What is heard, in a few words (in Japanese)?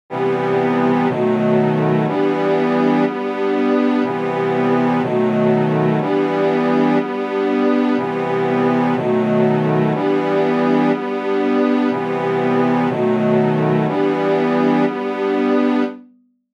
Strings, ストリングスのサウンド; Studio Strings; String Ensemble; ビオラやチェロ; コード伴奏; Logic Pro 11